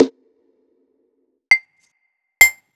Percs

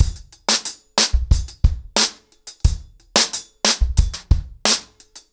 ACOUSTIC DRUMS, 90 BPM

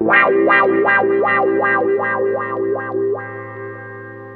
GUITAR

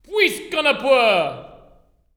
UTTERANC, LAUGHS; Spectrasonics; Symphony of Voices

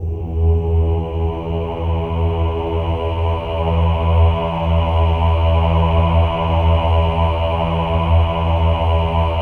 OH-AH E2 L, CHOIR; Spectrasonics; Symphony of Voices

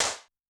Cap Gun, Yamaha DTXpress-II snare

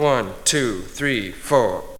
VOX SUNG; Megabass Remix! 2

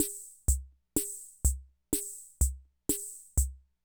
Loop, classic machines; arranger

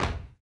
SUB, Human Perc, Body Perc; Spectrasonics; Supreme Beats; World Dance

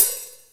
HIHAT OPEN; Back In Time Records; Big Bang; THE CONSTANT

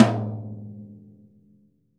MLTOM MF; VELOCITY TOM 2

